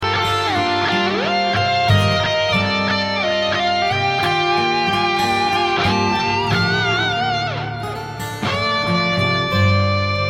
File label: Instrumental